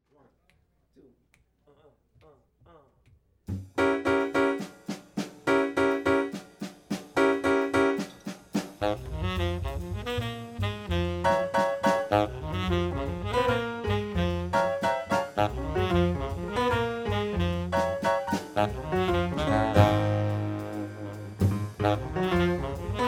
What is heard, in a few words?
tutti